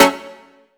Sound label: Synth Shot F#; Mutekki Media; Vengeance Electroshock Vol.2